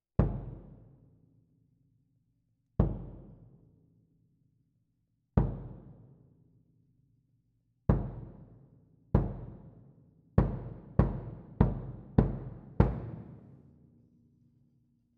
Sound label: Cassa Close